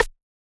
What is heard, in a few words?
TR 2 Rim